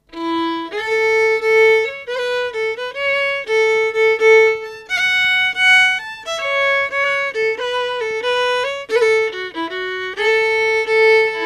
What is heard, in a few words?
auld lang syne